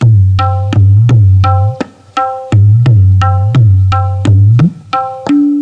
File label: RHYTH, LOOPS